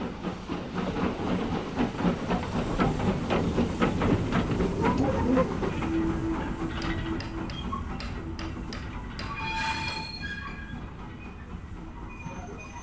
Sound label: steam train, steam train passing by